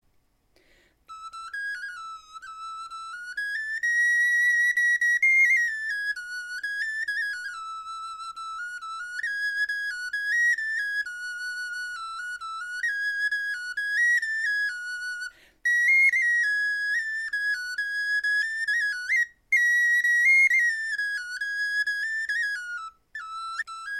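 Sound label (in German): melodie, Knochenflöten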